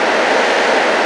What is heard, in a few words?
tac loop, vehicles; Starship Troopers